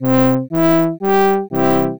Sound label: brass